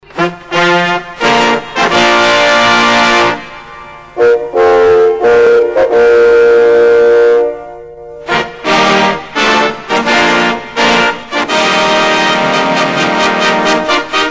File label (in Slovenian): planica fanfare